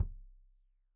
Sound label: Kik Stereo; Deeply Sampled Drums